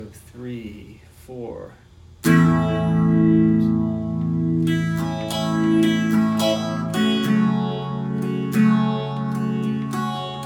with diff piano cadence at end

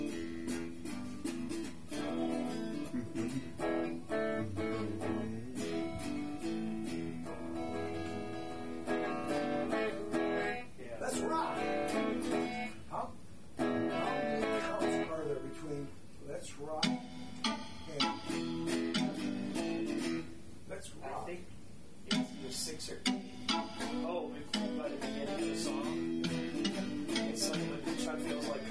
Boom Boom, Jams

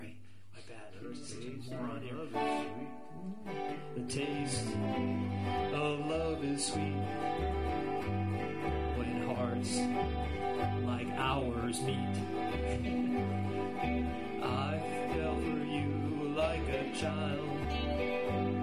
Jams

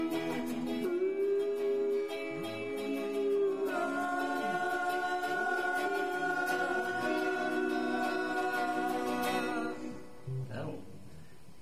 Harmony

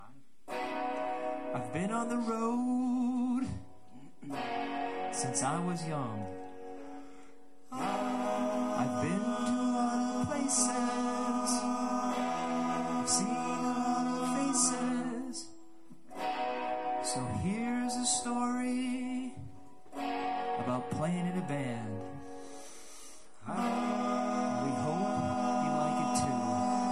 Summer harmonies only

